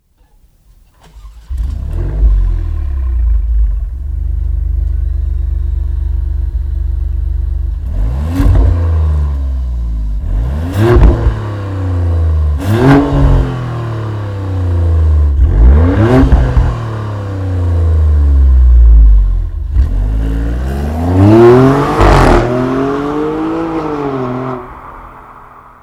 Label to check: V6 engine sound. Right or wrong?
Right